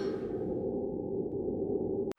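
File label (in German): zeit läuft ab; magic 2014; gamesoundopedia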